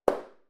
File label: peashooter; pineapple surf